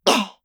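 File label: attack, Voice Line, Korean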